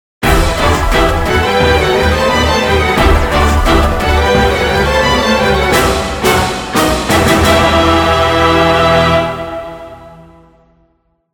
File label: Story Mode Game Over; dragon ball fighterz background music